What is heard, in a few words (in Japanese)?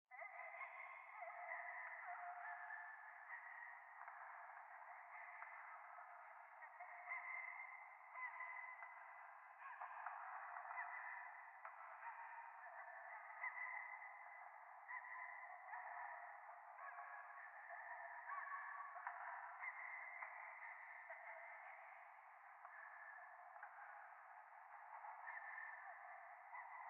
ゴースト 喘ぎ 電車